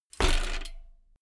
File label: bmx bike land impact rattle